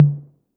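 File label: Roland808 mid tom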